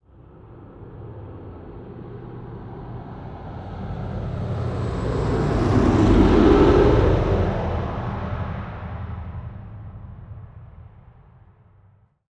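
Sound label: Ambient Vehicles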